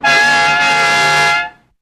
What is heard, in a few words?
Car Horn Old Air Horn